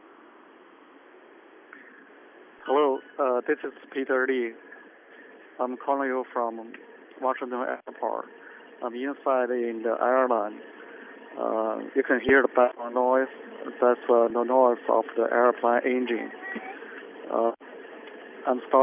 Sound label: airplane; noise reduction mobile phone